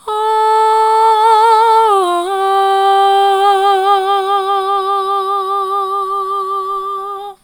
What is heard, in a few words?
ahh soft, vocal